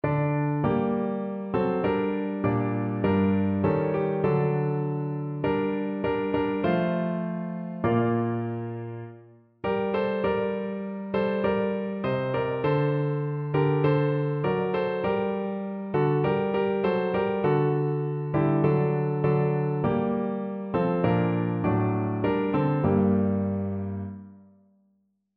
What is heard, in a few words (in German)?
maria durch, piano